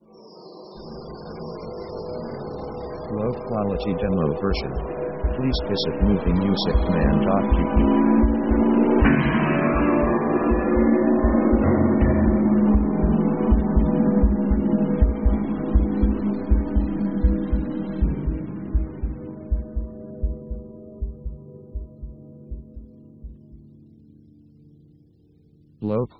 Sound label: Vertigo, strange; strange themes